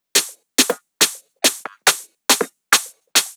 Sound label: drum Loop 140BPM HH